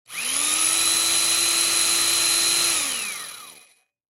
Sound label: Jigsaw Electric Run Short; Saws; Machines; Industry; Blastwave